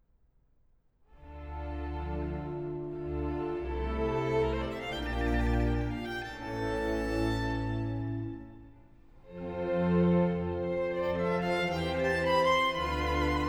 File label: Sonata for trumpet, strings and continua in D major, Adagio